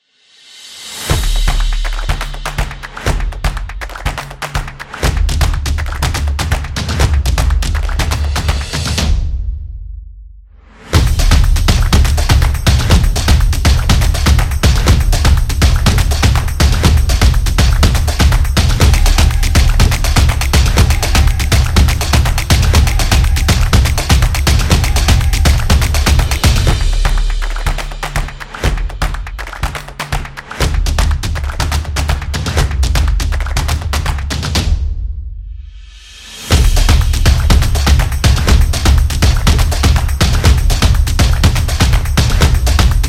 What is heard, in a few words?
Drum Roll; drumming action